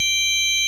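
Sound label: ORG Vox G, Continental organ; Keyboards of The 60's and 70's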